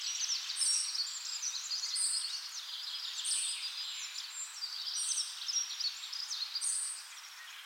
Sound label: Eurasian Nuthatch, Kleiber